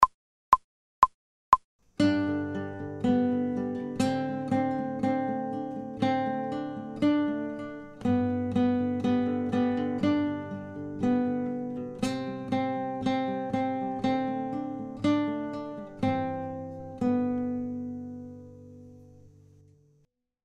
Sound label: fast without metronome, guitar